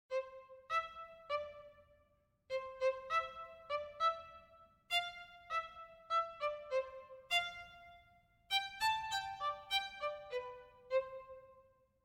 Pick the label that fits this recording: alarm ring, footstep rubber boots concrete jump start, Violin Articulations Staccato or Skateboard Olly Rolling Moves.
Violin Articulations Staccato